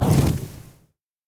flames impact; fire; skyrim

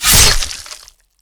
kill stab in

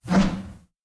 swing blunt 2 hand

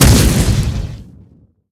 fire incinerate; skyrim

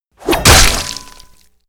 npc kill 1hm, chop, gore, slash, smash

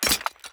weapon up; skyrim

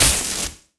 lightning impact

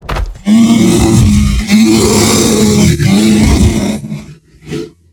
bear aggro warning; npc

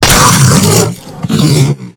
bear death; npc

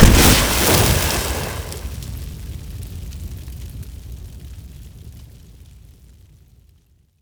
atronach flame death; npc

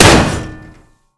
shield heavy block big

